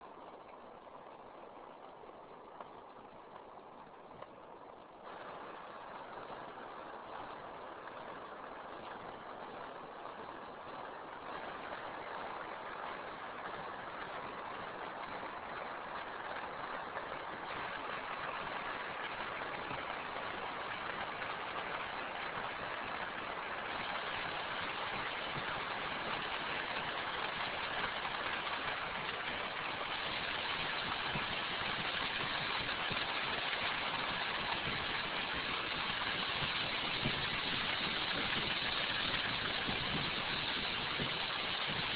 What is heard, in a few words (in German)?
applaus